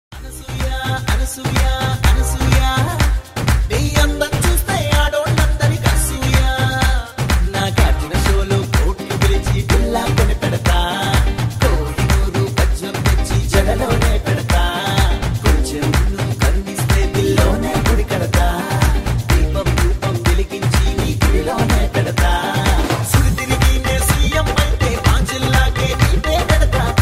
title telugu ringtone telugu ringtones; anasuya ramalingam

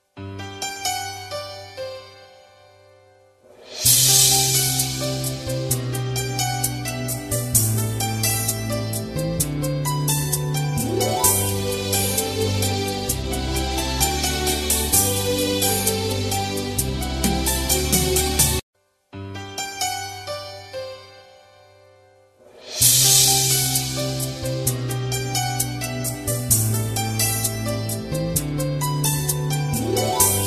mungarumale music bgm ringtone

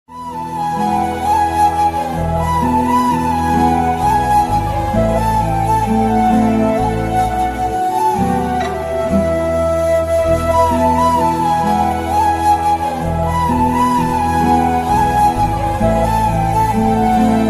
love ringtone; robert movie music

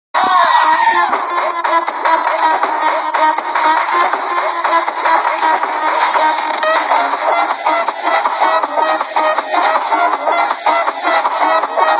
song bgm ringtone

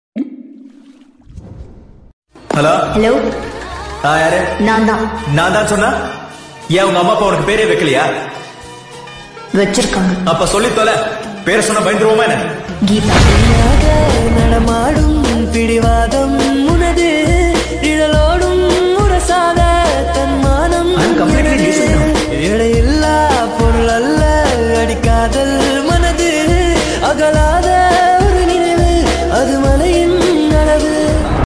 tamil; couples

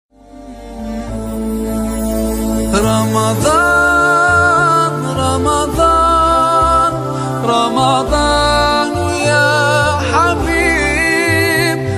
islamic ringtone

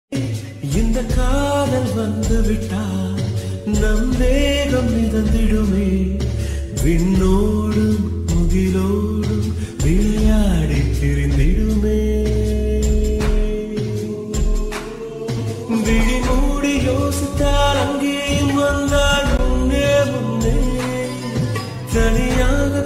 song ringtone tamil ringtone; suriya movie ringtone; malayalam; psycho bgm

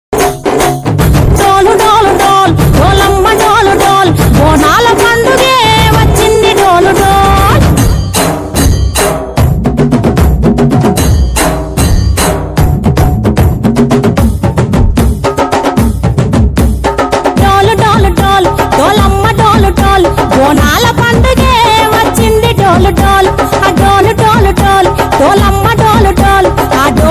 telangana bonalu special song; ismart

